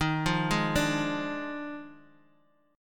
D sharp Major 7th Suspended 2nd Suspended 4th, down, Bass Standard; arpeggiated; JGuitar